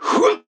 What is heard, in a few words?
Grunt Jump; Lex; Paladins